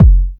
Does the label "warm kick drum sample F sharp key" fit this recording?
Yes